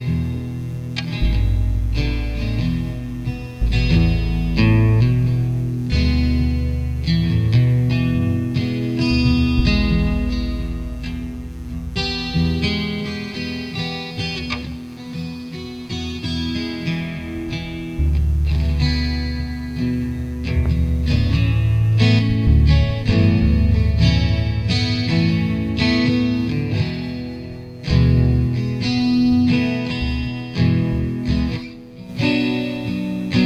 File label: Jam